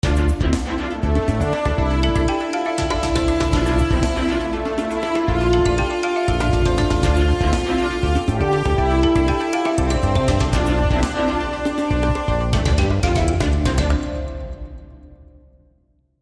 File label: Commercial Break Segue